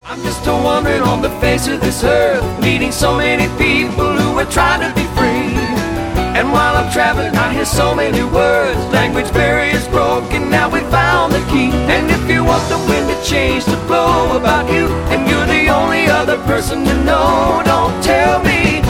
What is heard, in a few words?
car Rock & Roll Band